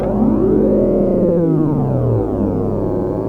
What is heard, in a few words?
StarShip Sounds